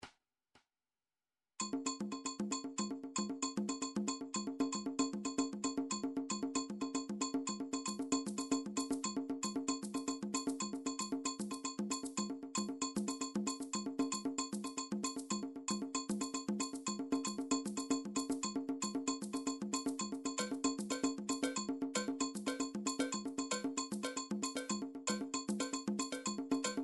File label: drum trax per